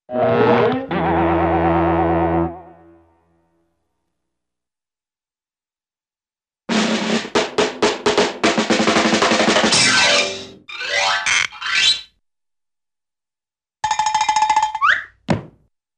Slow Head Turn 'wroing' - Trap Drum Set - Rapid Cowbell; Cartoon; Hollywood Edge; Cartoon Trax